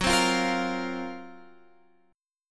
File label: F sharp Major 7th Flat 5th, Guitar, Open F; F#M7b5 Chord; strummed; x,13,12,12,13,12